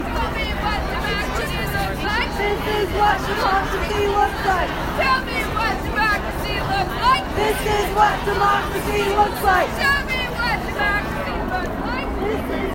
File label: This Is What Democracy Looks Like